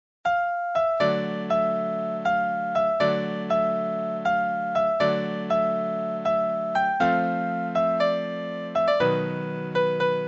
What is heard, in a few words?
Piano